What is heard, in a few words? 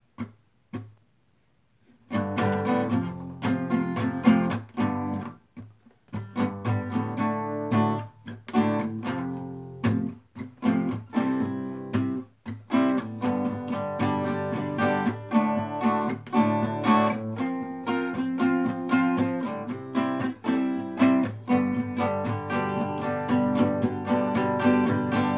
acoustic; brick and mortar; broadway buffalo wings